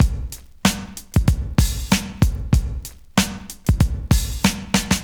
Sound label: fake break, Boombap drum loops